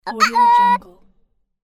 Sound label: cartoon joy show up shout